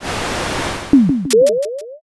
gacha roll

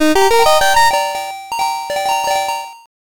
jackpot

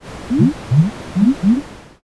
poison

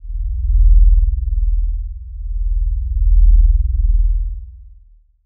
drone

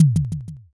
kick

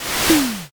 fire magic

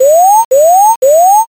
scan

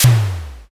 pistol

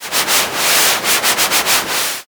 river

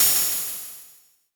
cymbal

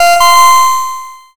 correct

